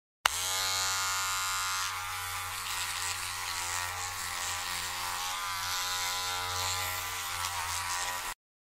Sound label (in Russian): zvuk ektrobritvy